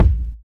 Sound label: sharp kick single hit a sharp key, bass drum sound tuned to the A# note; kick drum samples